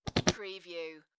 Whoopee Farting Blowing Raspberry Sound

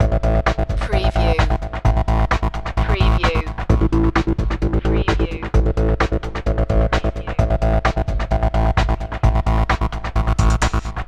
work in progress loop

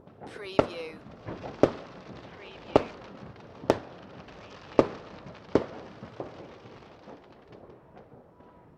Fireworks Bangs and fizzes; beanstalk audio

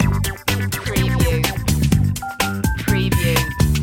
crazy kids loop no guitar

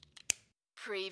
pen place cap on pen

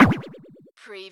CARTOON WARBLE

